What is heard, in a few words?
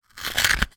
toilet roll card centre rip; foley; zapsplat